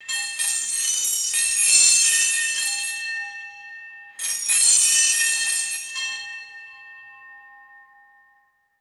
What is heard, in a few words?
low metallic user interface chimes; ui